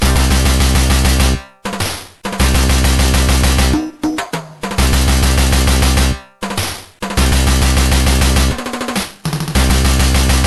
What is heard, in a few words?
Multiball Prelaunch, pinball; addams family the bally